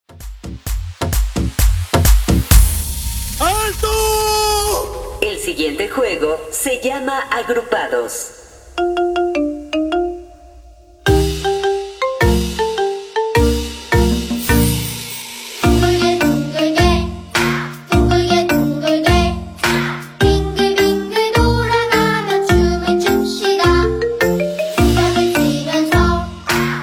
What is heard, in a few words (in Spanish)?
Intro Guaracha to Cumbia Cambio de ritmo, Bpm 130-102